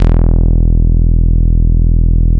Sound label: Bass Long E; VEC1; Club; Long Basses